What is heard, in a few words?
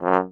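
FRHO STAB F#1, French Horn Staccato; Orchestral; DirectWave; Varazdin Orchestral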